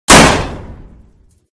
metal, bullet collide; Anomaly Bullet Cracks and Impacts Redone

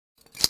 knife holster; Barrys Better Knives; weapons

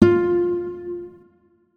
notes, guitar; Hideout Furniture

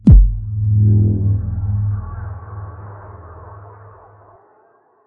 block discovered; Dynamic Zone Transitions

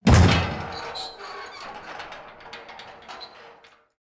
ambient soundscape underground

